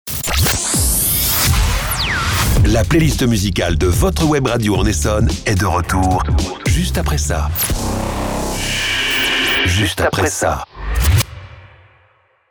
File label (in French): Jingle Décrochage Pub